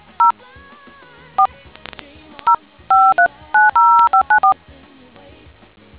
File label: dtmf